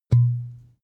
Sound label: glass bottle open